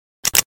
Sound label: vz61 unjam, weapons; Blindsides Reanimation Pack